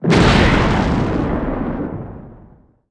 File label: explo large